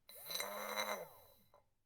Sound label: small drill on plate